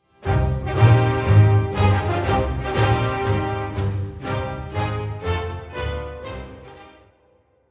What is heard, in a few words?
Play Stinger; Finley Sands